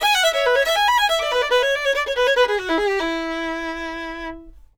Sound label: FIDDLE; Sampleheads; Whole Lotta Country 1 & 2; FIDDLE LP120